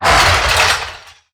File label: Melee Attack; Necramech; Battle Barks